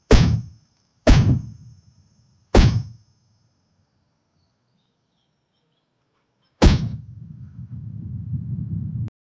hippo sounds bellowing; village

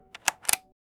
padlock being turned; sound effect

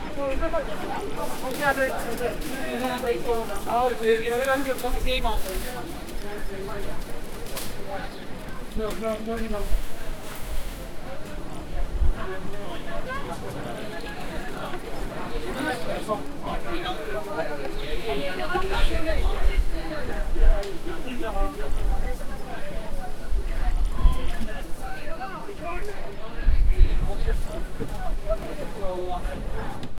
the sound of people shopp, market

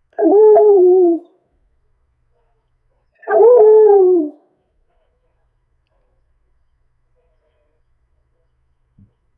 the lion helped the puppy, grateful

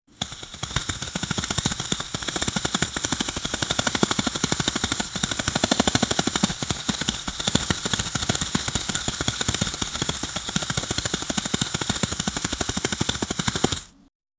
fast camara sutter

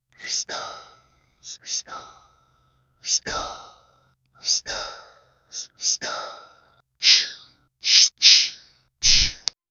soft slightly stabs; atmospheric

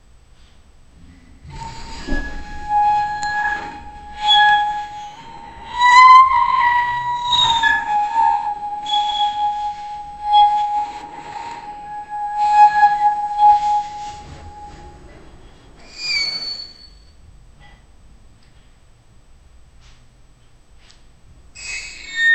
low frequency sound mix c; clicks

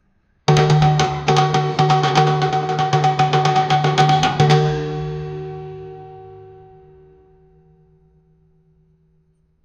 shamanic rattle; sound effect

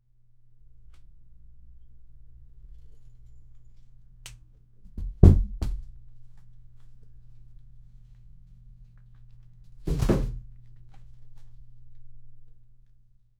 sound of a man falling

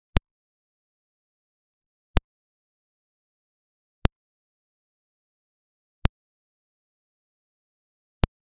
pov blinking eyes

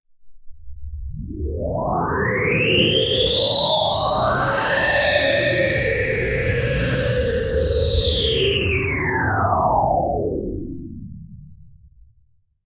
sci-fi sound creating a

